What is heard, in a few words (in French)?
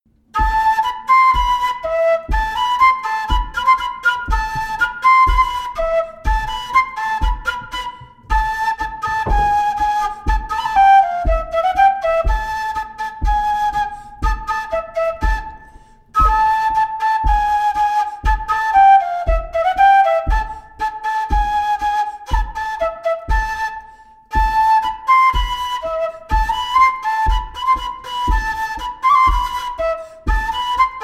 La Savoyarde bourree; Recueil Etienne Brun